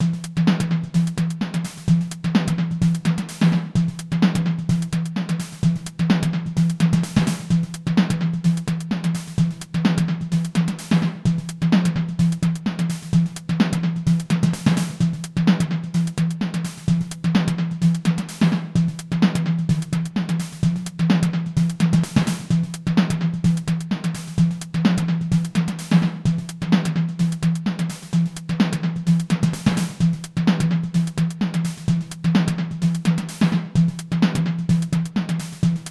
acoustic kit

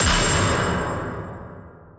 big hit; machine tribes

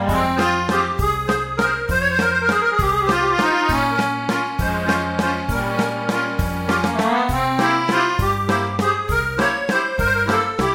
hohner accordion

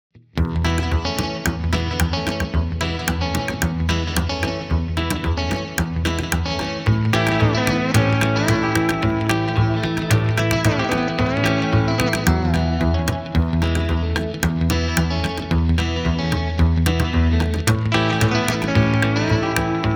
mystery bass

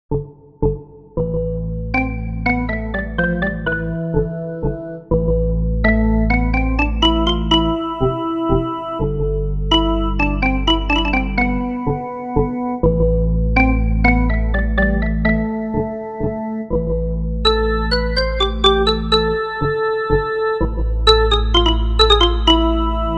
organux percussion organ test

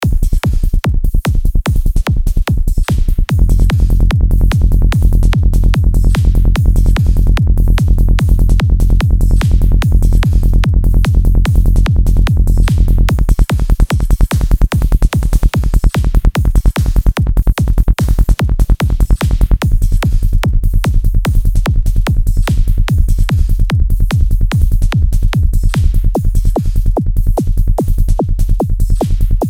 darkpsy forest night psytrance

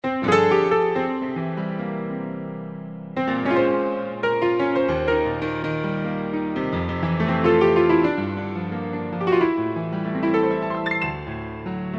piano sunny; apiano

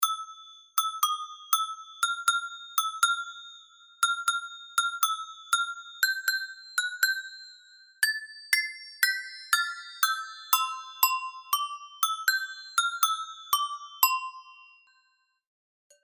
german almglocken cowbells

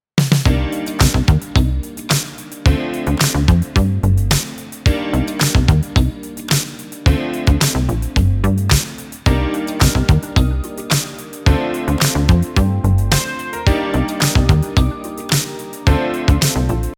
funk synth

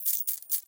musical coin metals

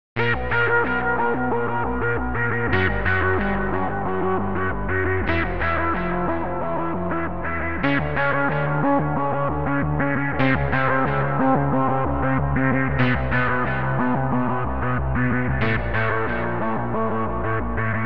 noise seq

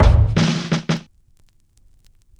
80s break

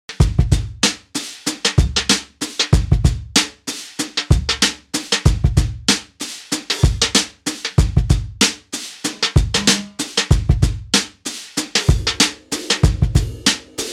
brushed breakbeat

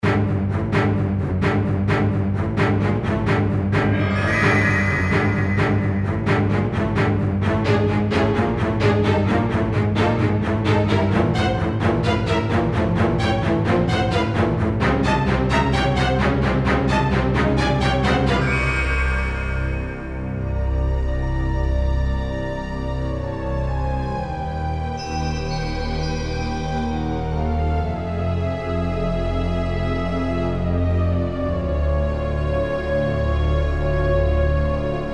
crystal lake strings

